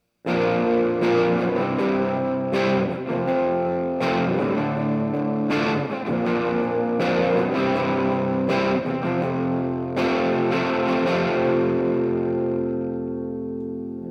elex gtr; JLab Talk Go

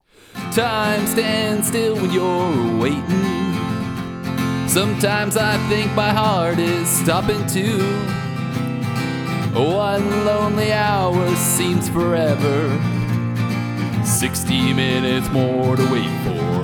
Vocals and Guitars; Shure SM4

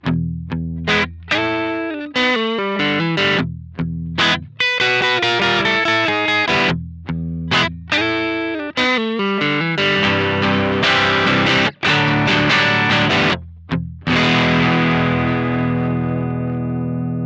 Orange Crush 20RT Mid Gain

